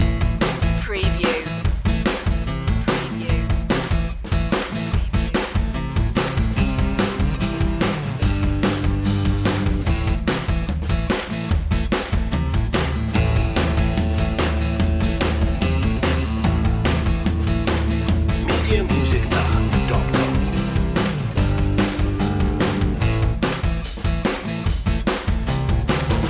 snakes and mountains loop

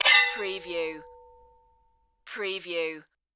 Swords Sing

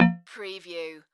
Multimedia Alert

